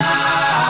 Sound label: Key chant; Luxembourg Zwee